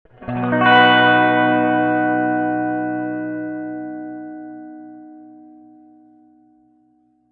reverb tank 3 spring long sustained chord

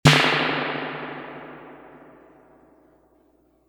reverb tank 3 spring medium drum machine snare

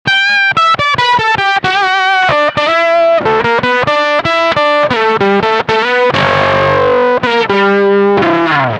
fuzz octave solo

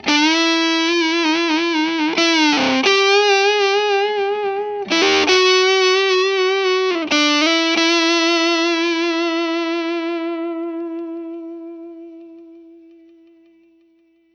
saturator lead settings dimed

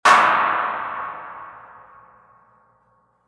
reverb tank 3 spring short drum machine clap

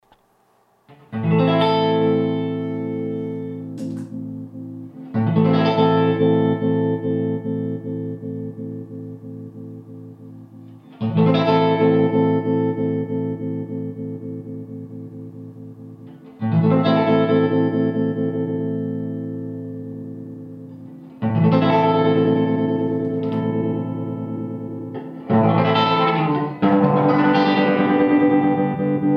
trill tremolo

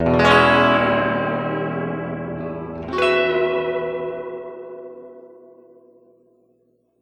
3 spring medium tremolo chords

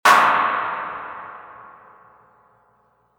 reverb tank 3 spring medium drum machine clap

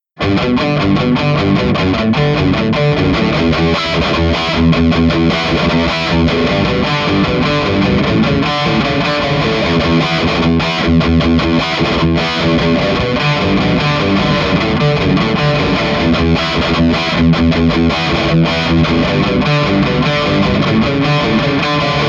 greenback distortion

